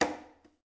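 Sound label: congas, left; Spectrasonics; Liquid Grooves 1 & 2; CONGAS+BGOS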